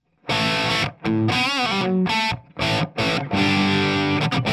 dirty bridge

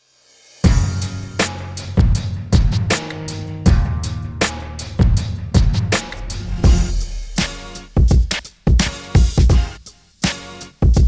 BPM OLDSCHOOL

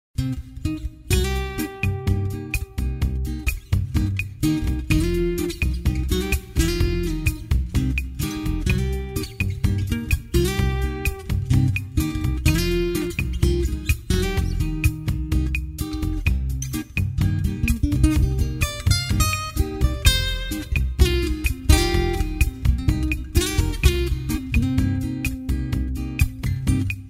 large bossa

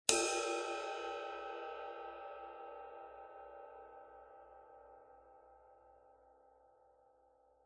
Raw Bell Dry Ride Bow

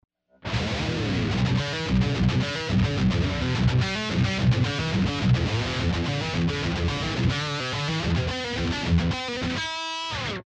EMG81 Bridge Dirty

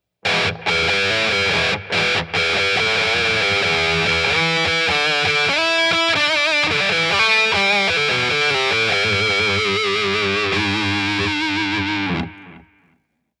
Cocked Wah Metal

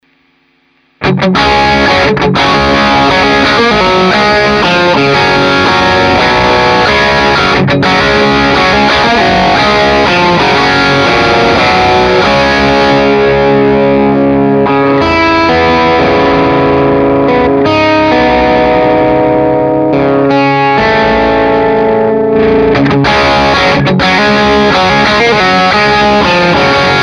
Plexi Tone Rock; Carl Martin

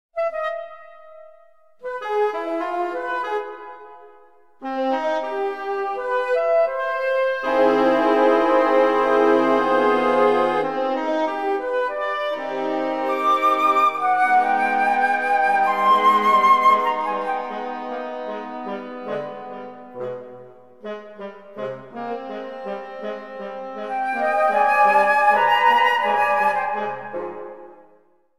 woodwinds

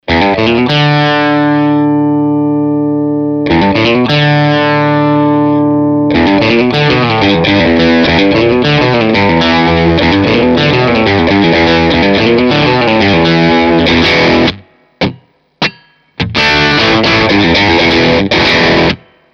Plexi Tone Crunch; Carl Martin

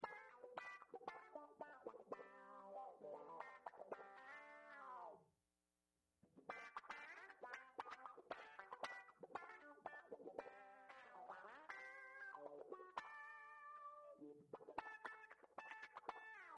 wah

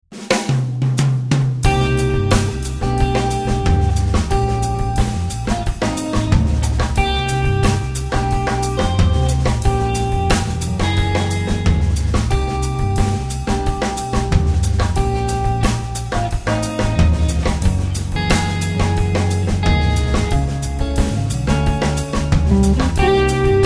world beat

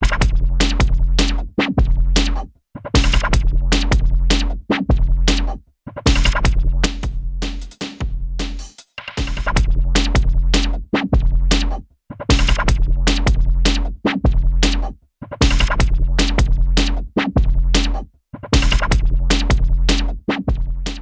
Drums

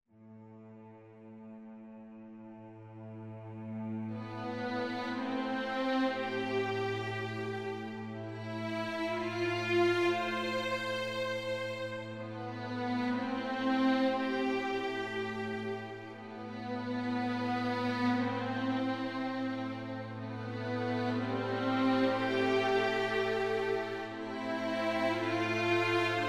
Strings

